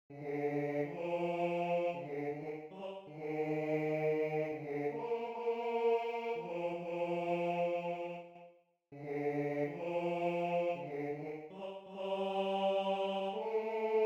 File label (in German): Bauernmesse Kyrie Bass I